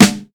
nice acoustic snare sample g sharp, Royality free acoustic snare sample tuned to the G# note